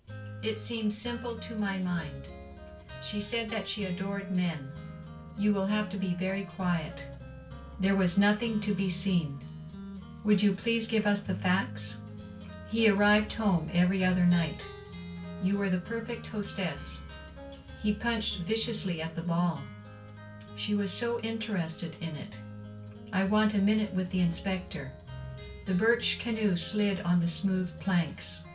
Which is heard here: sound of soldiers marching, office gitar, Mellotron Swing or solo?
office gitar